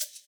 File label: NY HH, Drums Dance & Groove; USB Soundscan vol.11; AKAI; NYORK KIT